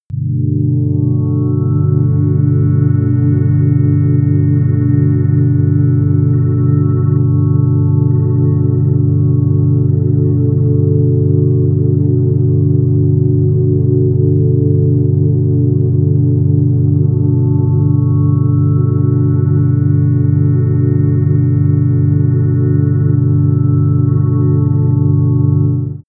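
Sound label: hallow air, pads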